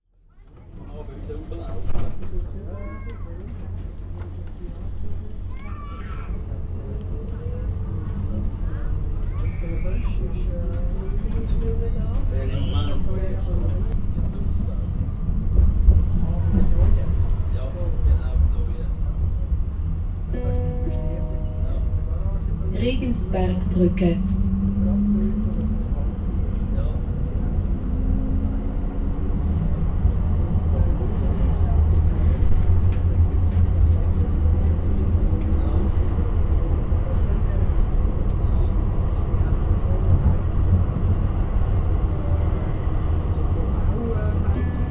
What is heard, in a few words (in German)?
Zueri tram